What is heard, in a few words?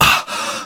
pain; genji